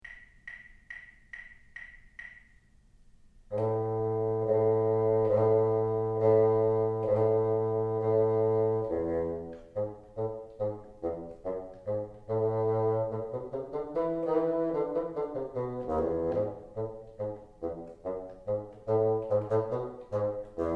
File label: teacher, bassoon